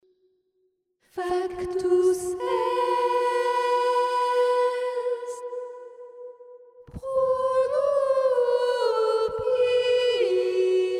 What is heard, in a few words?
soprano; passionne christus